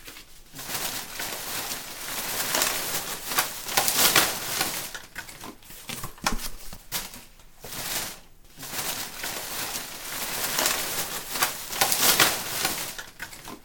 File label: scavenge garbage; horror